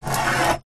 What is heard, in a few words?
household clothes hangers plastic slide on metal rail